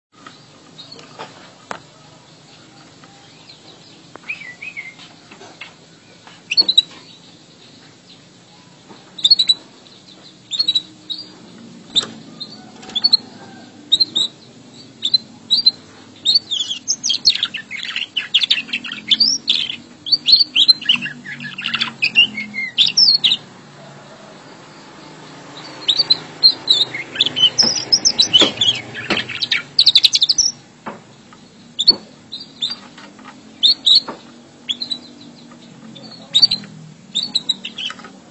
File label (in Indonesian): Suara Kenari Ngriwik Ngerol Gacor; burung Kenari terbilang menjadi jenis burung kicauan yang cukup populer